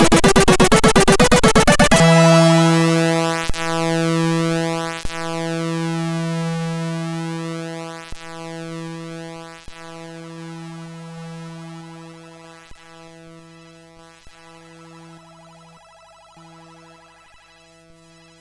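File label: Rockit, MOS 6581 SID chip music, games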